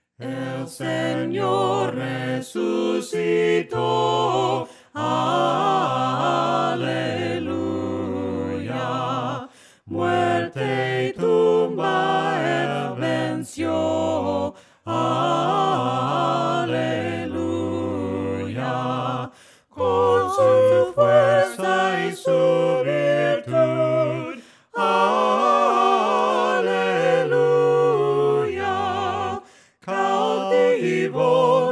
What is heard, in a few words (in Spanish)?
VOCES, himnos a capella; cantos del camino; EL SENOR RESUCITO